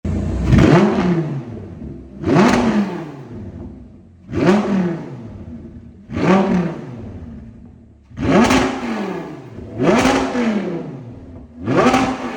Audi R8 revs, audi r8 gt v10; r tronic quattro coupe 560ps phantom black metallic

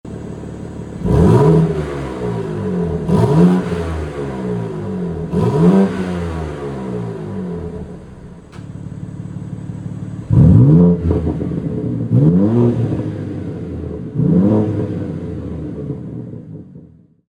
revs, bmw m3 g80 saloon; x drive; porsche mexico blue

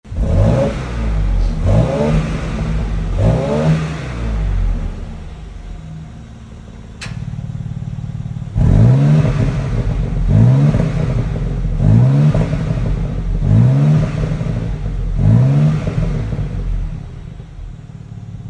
Revs, bmw x3m competition; brooklyn grey; lci

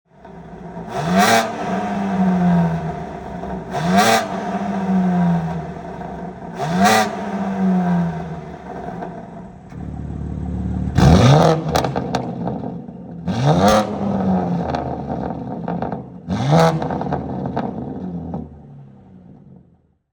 BMW G80 M3 Saloon stage 2.5 738ps revs; competition; brooklyn grey; tom wrigley

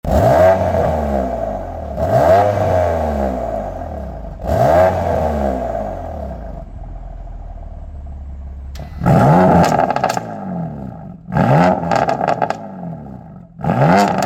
m4 revs, bmw m4 f82 competition; tuned m performance 763m frozen gold kw ddc; tom wrigley

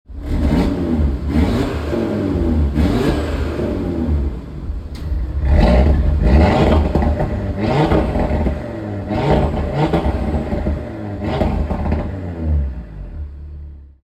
revs, audi rs3 8v sportback; ara blue crystal; stage 2 496ps

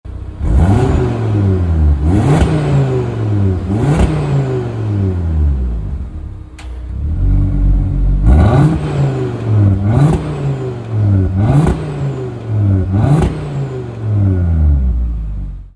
rs3 revs, audi rs3 8y; nardo grey audi exclusive; rs dynamic pack

